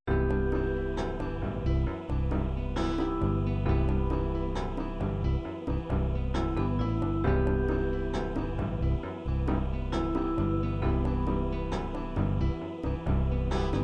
ballads ballad acoustic, midi

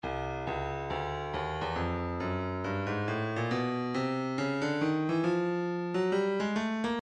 breakbeat midi files 065 069 bpm; Lowly C; Stylus Breakbeat Midi Files

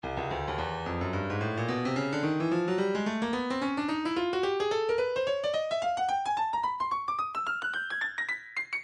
breakbeat 109; soho b; Stylus Breakbeat Midi Files

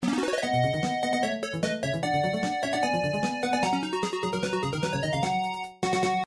victory; nintendo mega man v

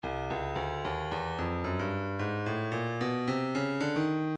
breakbeat 111 bpm; Buckwheat B; Stylus Breakbeat Midi Files

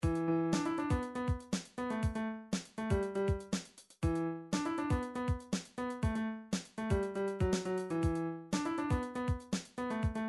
funk, midi

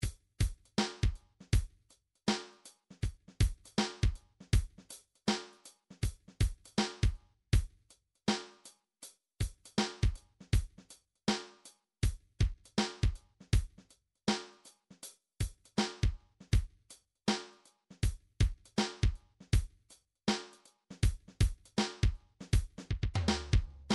midi drums

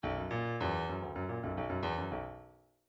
midi file grooves funk

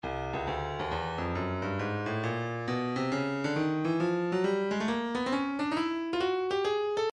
breakbeat 068 bpm; 065 069 Bpm; Grime B; Stylus Breakbeat Midi Files

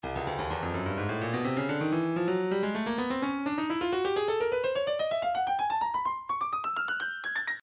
breakbeat midi files 125 129 bpm 127; Trixie E; Stylus Breakbeat Midi Files

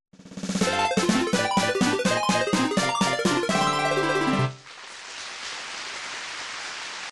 congratulations fanfare; Game System Super Nintendo; Vegas Stakes

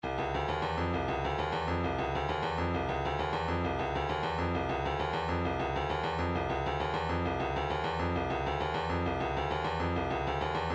tambourine, midi

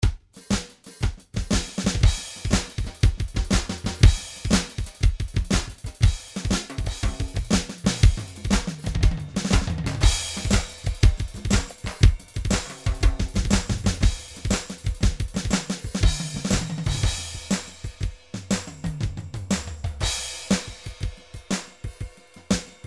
drum grooves midi loops shuffle; Steinberg Nice Beats 1000

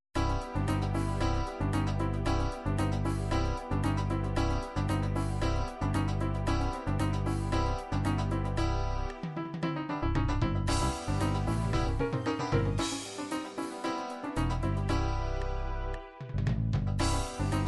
dance new samba, midi

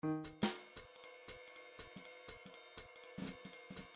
midi grooves bebop

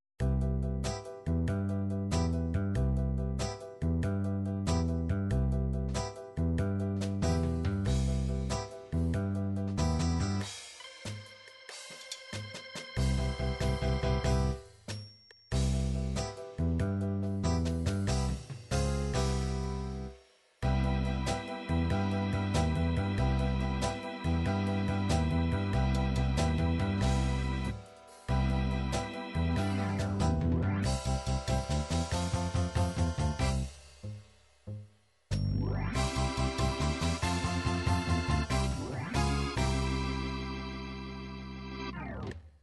6 8 blues, midi

